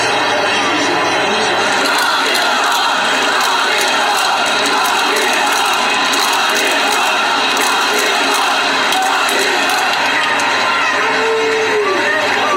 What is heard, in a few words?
Chants; Lock trump up